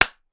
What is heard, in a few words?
Clapping Hands Slap Single Clap Close Up; 96khz Mono; Zoomh4n; Nt5